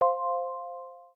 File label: Light Touch, notifications; Samsung; Galaxy A56; Calm